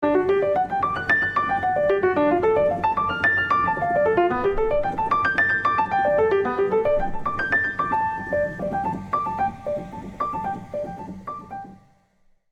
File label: Piano Arp dry